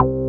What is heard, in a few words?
MOOG BASS